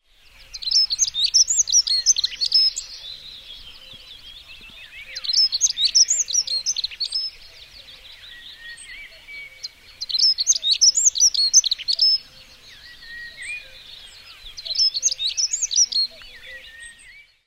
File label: dunnock